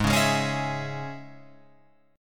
G sharp Suspended 4th Sharp 5th G sharp, Guitar Open F; G#sus4#5; strummed; JGuitar